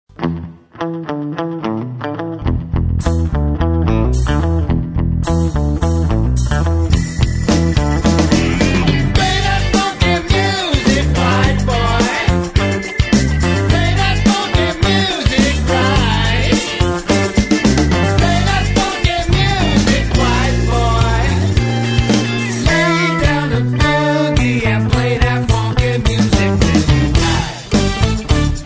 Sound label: Funky Music